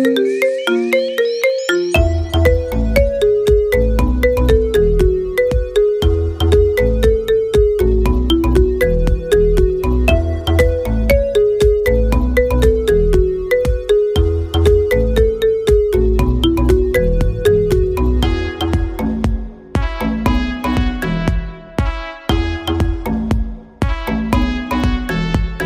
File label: iphone 11